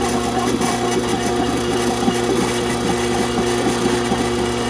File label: grease disposal weird gargl